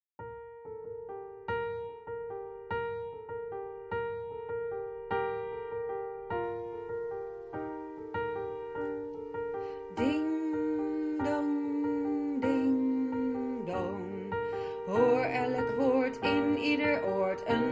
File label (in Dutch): alt partij; Ukranian bell; Setlist Kerst 2021